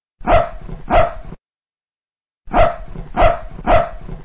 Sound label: dog barking